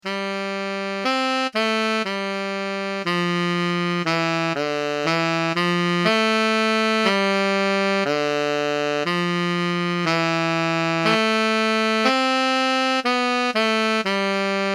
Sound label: sax